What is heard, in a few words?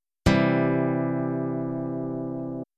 c major; chord contruction; guitar